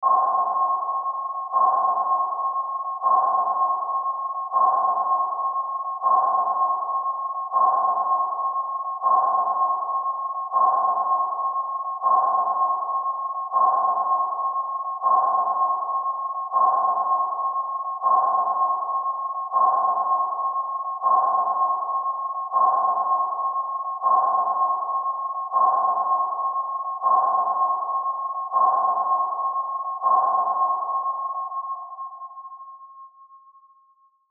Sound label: Submarine Sonar Ping Multiple; Miscellaneous Combat Sound Effects; Military; Blastwave; Warfare